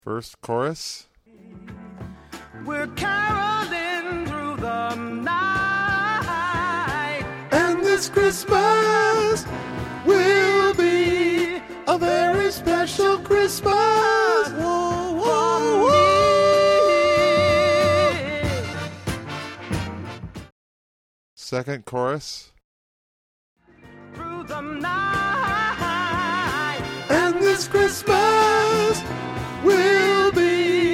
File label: Sopr